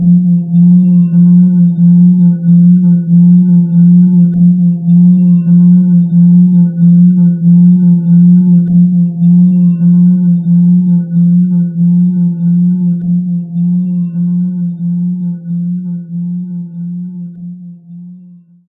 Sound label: Buzz Red; Glow Artisan; DSiWare; nds